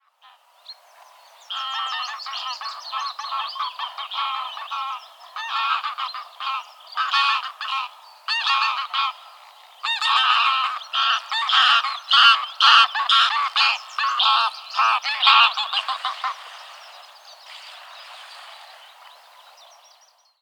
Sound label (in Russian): seryy gus golos